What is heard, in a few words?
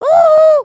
wave, emotes